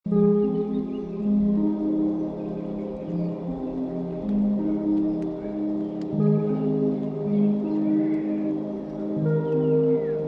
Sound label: slowed reverb